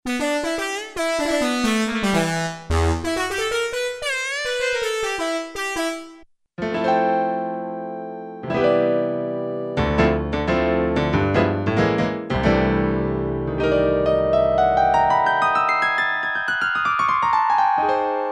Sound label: Voices, Yamaha S5502 Drums